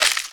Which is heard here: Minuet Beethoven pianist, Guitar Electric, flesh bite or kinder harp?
flesh bite